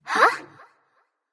confused light; smite; voicepack; hel

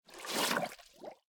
flame trail; Smite; Sol

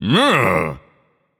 yay; smite; poseidon

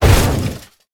bulk metal hit; Smite; Cabrakan

